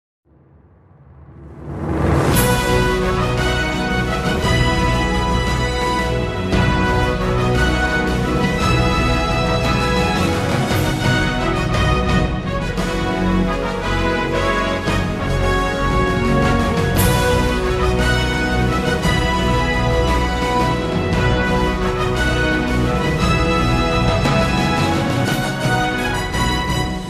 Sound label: Overture; qfg5